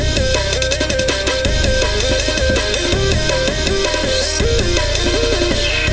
25% loop

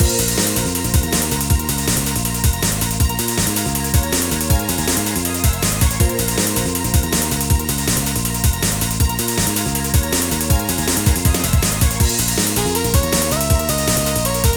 Music title loop; sm-ssc

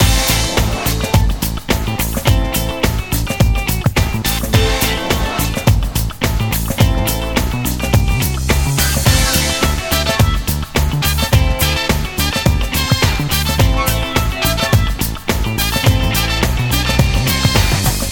music loop

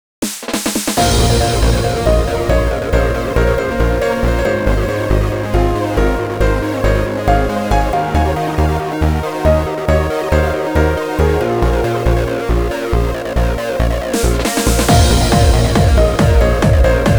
music loop

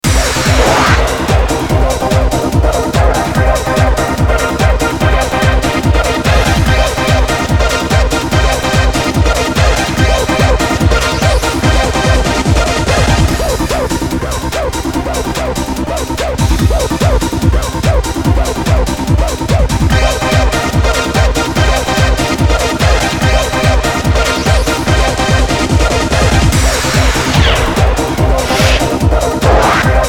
common menu music (loop); itg

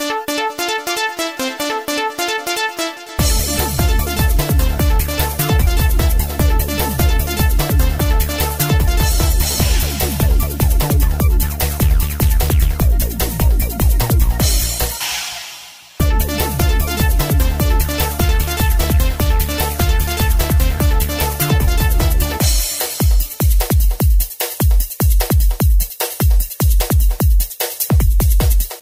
music loop

